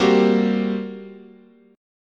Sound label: G Minor Major 7th Double Flat 5th, chord, guitar